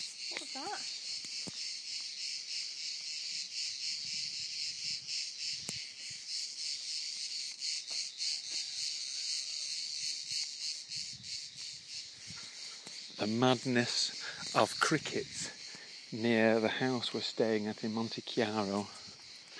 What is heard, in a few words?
crickets in Siena